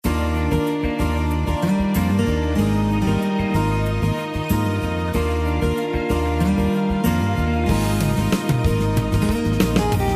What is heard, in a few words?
RockPop